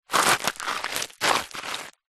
foley shoe scrape fast gravel stones on road or path